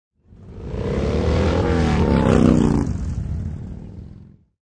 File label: Motorcycle drives by.; Vehicles; Motorcycles